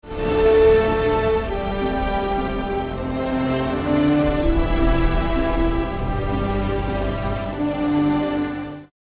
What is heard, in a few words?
ringer; Ringtones